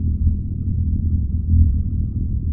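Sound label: Ambience Sci-fi Energy Loop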